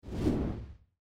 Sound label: ease